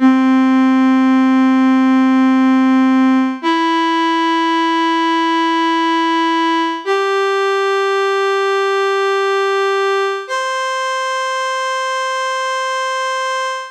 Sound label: synth strings